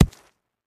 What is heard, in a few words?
Concrete Chunk Drop; rocks & dirt